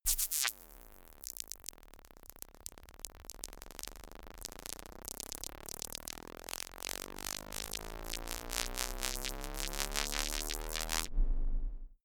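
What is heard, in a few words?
organic glitch riser, Science Fiction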